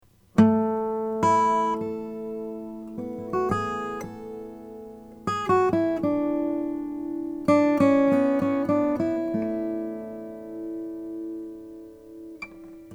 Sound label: tippin omt brazilian rosewood